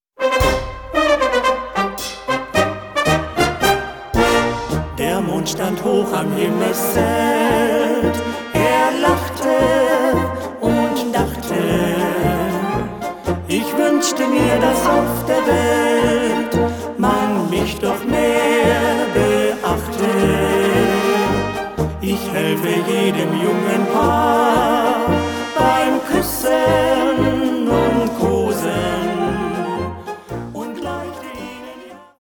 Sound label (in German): Blasmusik